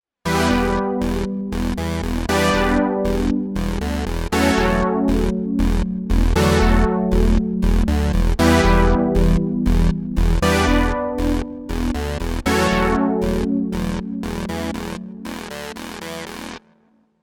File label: Noisy Poly